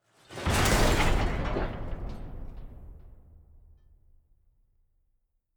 metal chain stack drop wide

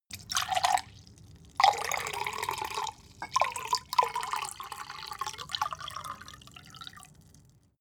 glass unsteady; berufe; gastronomie; soundpool

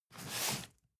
cardboard box medium slide short; objekte

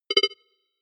notification spot; soundpool

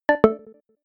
notification signal; kommunikation; signale

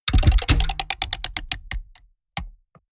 mechanics medium rattle; industrie wirtschaft; mechanik

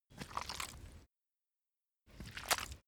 step mud slow normal; schritte

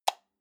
light switch on

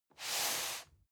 cardboard box medium slide short soft; objekte

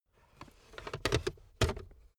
car backseat table pull up slow

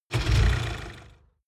van start engine; verkehr transport; autos

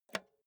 insert xlr multi socket hard; objekte; elektronik

